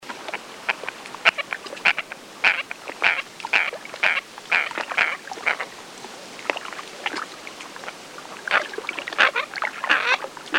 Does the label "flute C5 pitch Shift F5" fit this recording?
No